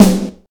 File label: strong bottom end snare sound f sharp key